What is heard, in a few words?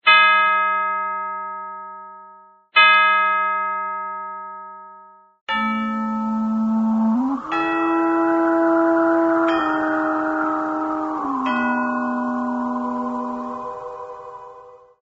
ringtone